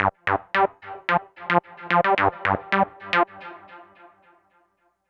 Synthetic Riff; Dr. Fink's Funk Factory

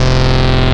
Resonant Chord, wavetables; Animoog

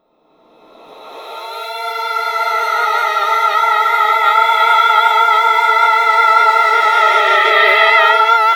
REVERS FX, REVERSE FX, Funky Vocals

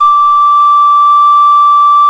SOP D 4, MOECK; SoundBytes - Early Patches

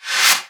Reverse FX

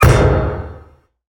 Ping Pong, Industrial Hits; Antidote Advent

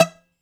BOUZ PIZZ E 1

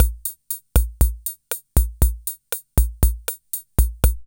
BOSSANOVA, KR55; INIS - Funky Xtreams; KR55 LP2

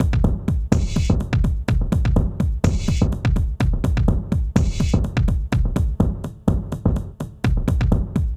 TECHNO, Funky Xtreams; INIS

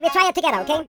YODEL YELL, World Voices

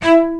Spicato Basses & Celli

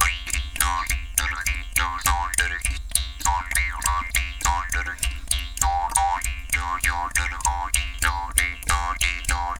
JAW GROOVES, Didgeridoo; Big Fish Audio - Didgeridoo and Other Primitive Instruments

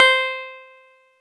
Clavinet C5; USB Soundscan vol.09; AKAI; Keyboards Old School

